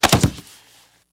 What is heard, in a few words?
Body Fall Wood Floor